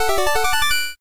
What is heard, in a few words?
Jingle Minigame Goal; Dandy Dungeon Legend of Brave Yamada